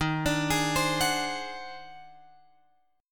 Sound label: D sharp Minor Major 13th D sharp